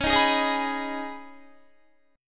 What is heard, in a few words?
Db 7th Suspended 2nd Db, down, Guitar